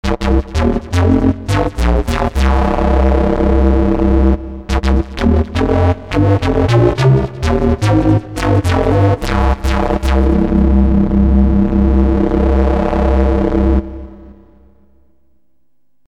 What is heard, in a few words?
jungle massive